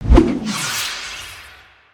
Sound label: Samurai Ronin Rush